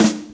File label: SD, Dance Drums